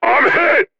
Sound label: wound